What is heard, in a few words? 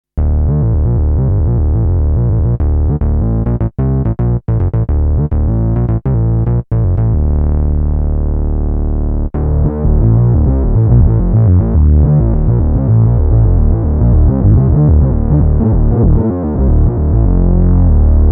Thin Bass